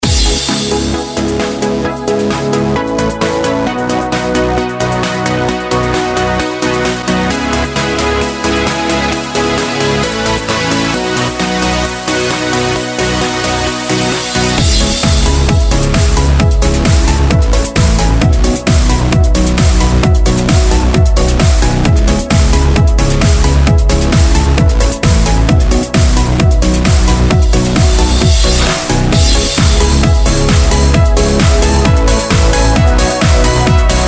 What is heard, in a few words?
Uplifting Trance